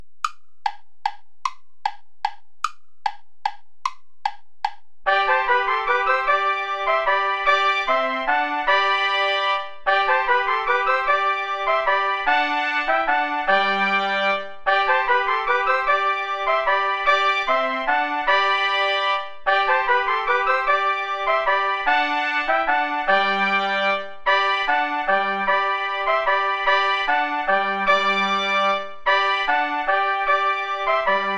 volta play along without trumpet; praetorius michael

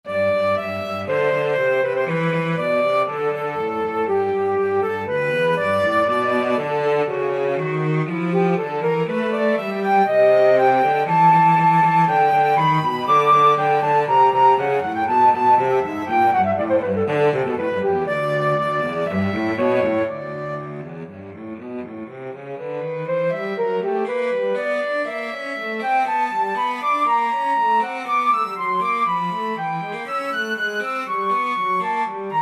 music for two con moto

